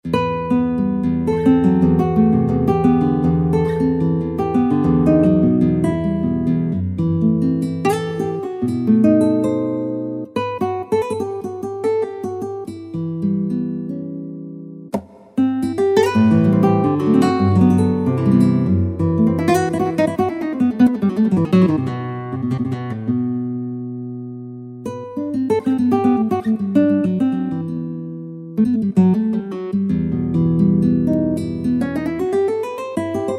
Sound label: Classical Guitar